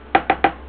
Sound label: Knock; IN CONTROL 2.0